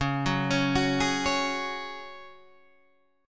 C sharp Suspended 4th C sharp down Guitar Half Step Down